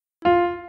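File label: note sounds; zvuki not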